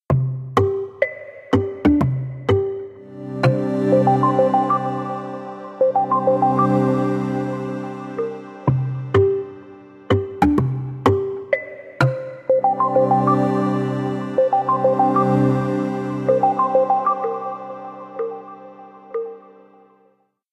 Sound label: Wood Dance, ringtones; Honor; X7a